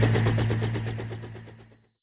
Helicopter

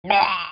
vommit

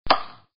axe

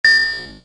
ding

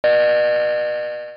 phone tone

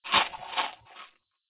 rhino eat

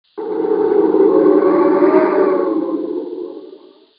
wind fade out

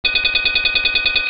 Bell school

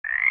Frog

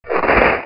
snow thump

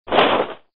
parachute open

